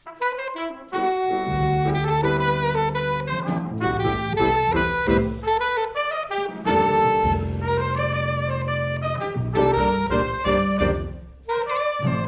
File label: Jazz